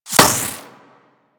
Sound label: Laser Impact Metal; Warframe; Weapons